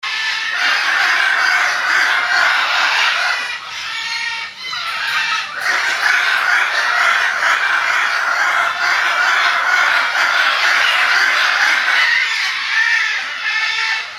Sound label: Hyacinth Macaw, Parrot Calls